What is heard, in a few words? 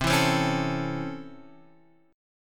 Db Minor Major 7th Sharp 5th C